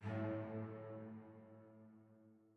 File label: chime old; chime sharp